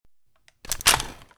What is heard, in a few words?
Inf Weapons Foley; Red Orchestra 41-45